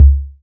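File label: Olson BD B, bass drum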